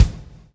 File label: PULL, Backbeat; Spectrasonics - Backbeat